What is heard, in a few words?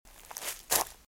Footsteps Leaves Shuffle; RadwinIsland